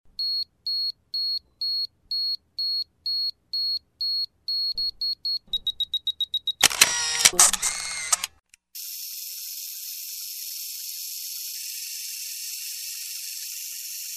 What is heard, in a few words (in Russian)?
avtomaticheskaja fotosemka s obratnym otschetom shhelchkom kamery; Звуки обратного отсчета